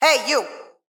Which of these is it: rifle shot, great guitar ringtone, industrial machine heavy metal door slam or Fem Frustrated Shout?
Fem Frustrated Shout